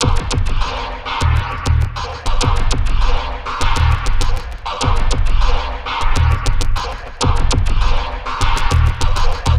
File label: Zappy Loop; Sonic Foundry (Sony Creative Software); Esoterik Beatz